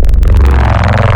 Reesey Mess, Spectral; Xfer Records Serum; Serum Presets

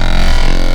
Trilobyte, Spectral; Xfer Records Serum; Serum Presets

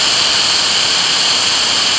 Air Can, Noises Organics; Xfer Records Serum; Serum Presets

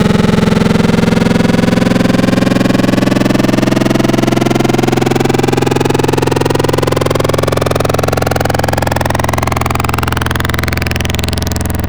PWM Electro, Digital; Xfer Records Serum; Serum Presets

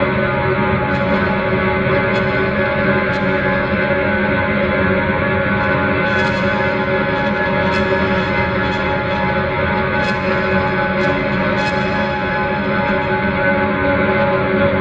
Place Of Fear, Serum Presets Noises; Xfer Records Serum

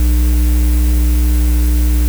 AC hum; Noises, Organics; Xfer Records Serum; Serum Presets

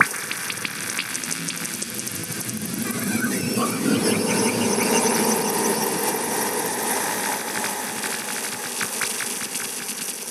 Electricity, Noises; Xfer Records Serum; Serum Presets

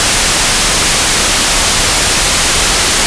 ARP pink, Noises, Analog; Xfer Records Serum; Serum Presets

